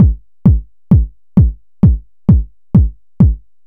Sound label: BASSDRUM; Music Store Sound Service; Einstein